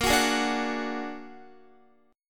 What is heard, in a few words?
Bb Augmented 7th Bb down Guitar 4E,4A,5D,5G,5C,6F; Bb+7; strummed; JGuitar